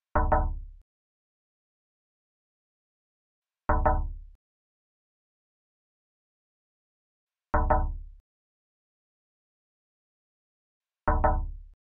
knock on window

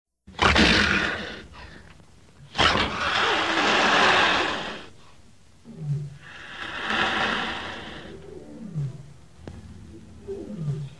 black panther, پلنگ سیاه

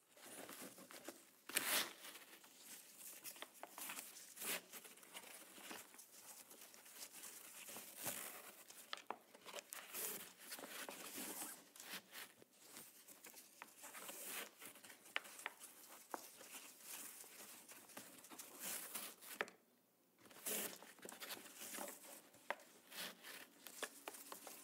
tying shoe laces; بستن بند کفش

